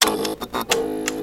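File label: Electrical Tone Pulse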